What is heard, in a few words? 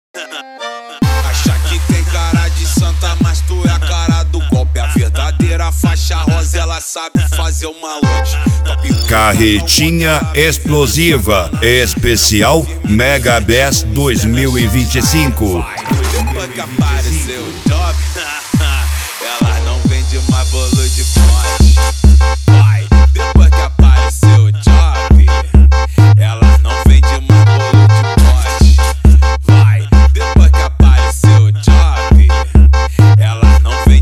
mega bass